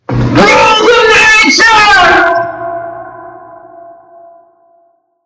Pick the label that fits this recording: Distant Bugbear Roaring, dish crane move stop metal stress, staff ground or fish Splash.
Distant Bugbear Roaring